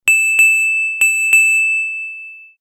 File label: Steel, Ringtones; Samsung SGH-F310 Serenata